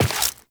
Step Rock, Footsteps, Walk; Warframe; Tenno

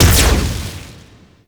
Spin Beam Start; Enemies; Weapons; Warframe; Tau; Sentient Dactolyst

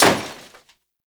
Scooter Impact; Warframe; Ambience; Nineteen Ninety Nine

